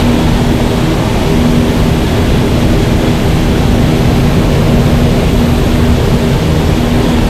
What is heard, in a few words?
engine tvd cockpit; War Thunder